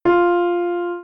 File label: medium melody start note; Medium Melody 2: Tonic